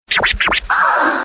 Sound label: Scratch Sms